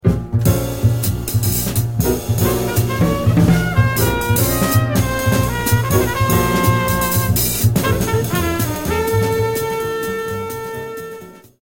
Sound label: Bee Vamp